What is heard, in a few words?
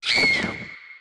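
damage up, monster; thedead3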